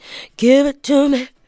ADLIBS

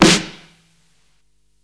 Snare, Psychedelic Drums

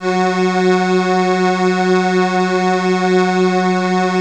CRUMAR; Masterbits - Vintage Voltage; CRUMAR PERF

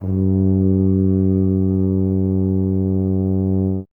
BRS TUBA, Solo Instruments

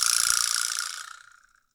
VIBRASLAP

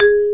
MARIMBA G#3; MALLETS

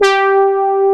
BRASS G3; E-MU Formula 4000 Series Vol. 3; Analog Odyssey; JXBRASS 3

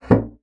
bassoon slap; Xsample VOL-6; C BASSOON

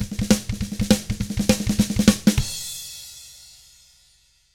Drums, SHUFFLED; ILIO; Double Platinum Drums 1

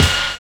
PLANE BEA; Univers Sons

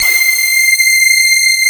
CMI PIANO; Wizoo; Powered DX

